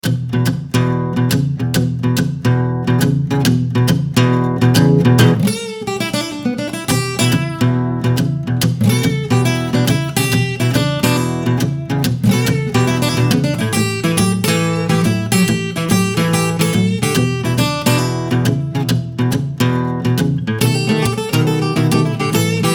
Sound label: American Acoustic Blues; traditional classical blues; fun videos, western and cowboy movies